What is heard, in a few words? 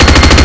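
regular fire; Unreal tournament final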